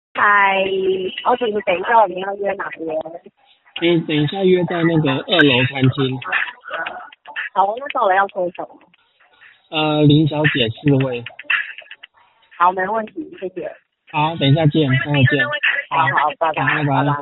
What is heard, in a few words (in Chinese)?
餐廳關降噪